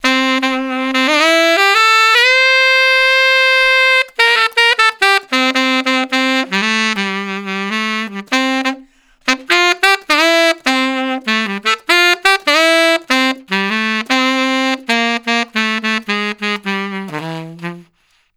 Tenor One Shot, tenor sax melody; Sonic Foundry; Jazz Solos and Sections; Traditional

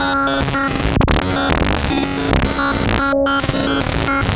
Random, LFO & Noise

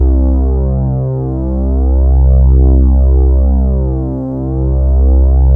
poly synth; Sound Gallery - Charged; POLY SYNTH 1

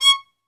Synclavier Strings, SOLO VI; ILIO

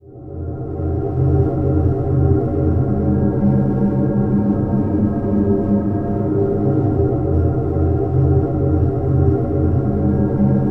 Tomb Drone; Zero-G Seismic Frequencies; Ancient Drones; 90 s Sample CDs

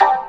VOCODER DRUM

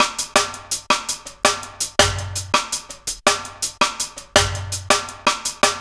165, Drum Loops, Drum & Bass

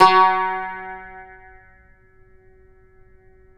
Cumbus F#3; Spectrasonics; Hans Zimmer Guitars VOL-2

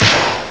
pop; ST-XX Amiga Soundtracker Sample Packs

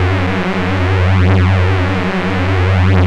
PULSE F#2; Best Service Alpha Dance vol.2

